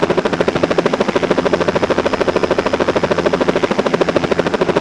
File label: Sound Helicopter; Flying Scholarship; CadetCD